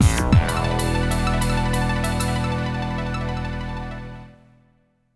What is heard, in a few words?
Score Ranking; Vampire Chronicle for Matching Service; Dreamcast